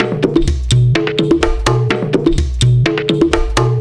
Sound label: tribal perc 126 solid; Wave Alchemy Loop Tools and Grooves